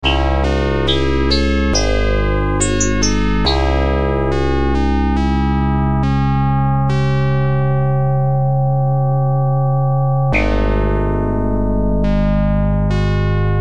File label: ordos dirge; dune; sega